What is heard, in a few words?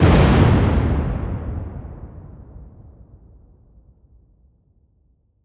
Explosion Blast Large; Fire and Explosions